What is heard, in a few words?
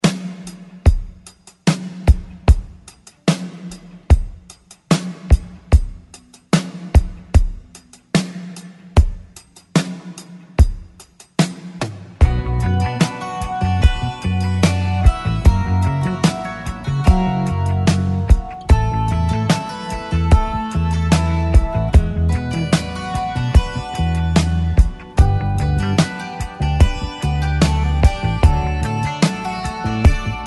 70s Re Drum 128 Bpm; DJDOLLS